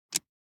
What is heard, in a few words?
DICE on Neoprene, Grab, Standard, 4 Four Dice; Samples; ArticulatedSounds; DICE Sfx Party (Extended)